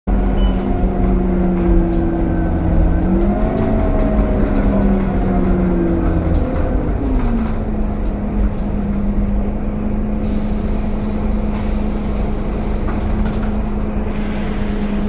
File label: Unpleasant Sound Bus